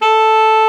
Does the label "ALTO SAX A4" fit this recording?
Yes